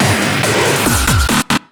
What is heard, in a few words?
Fills, Loops 140 BPM